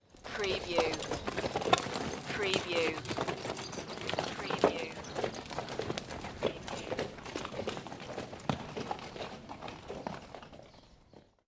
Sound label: Three horses walking by on gravel